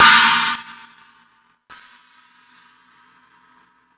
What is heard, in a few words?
Rock N Roll, E-MU Pro-Cussion